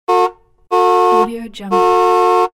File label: taxi beeps